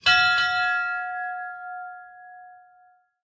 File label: Bobber Bell; candy crush saga gamerip